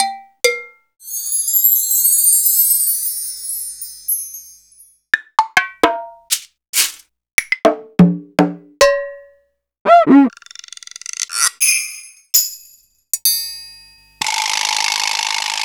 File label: Akai MPC2500 Percussion Kit